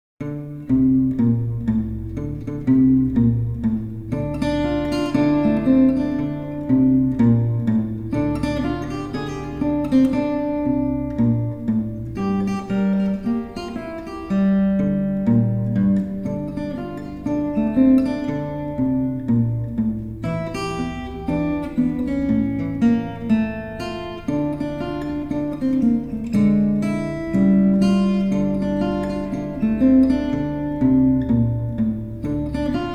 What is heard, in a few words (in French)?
ac blues en re mineur